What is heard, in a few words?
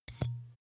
glass bottle handstroke hard long